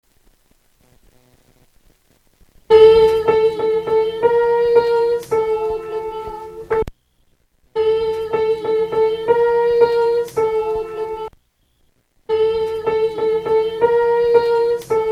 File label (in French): Kyrie Soprano